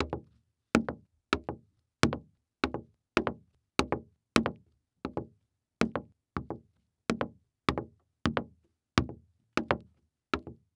Foley Footstep Hard Sole Shoe Walking On Solid Wood Platform; Foley Footsteps